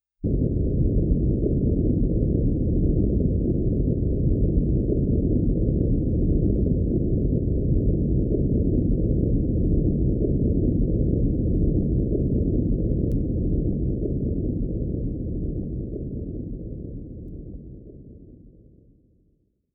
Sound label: Elevator; Clock Tower 20th Anniversary Sound Collection